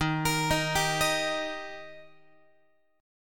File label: D sharp Major D sharp Guitar, chord; arpeggiated; x,11,13,13,11,14; JGuitar